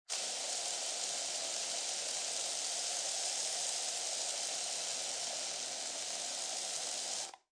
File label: Aerosol Can Spray Long